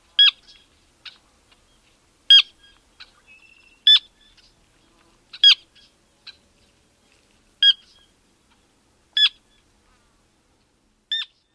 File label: keep calls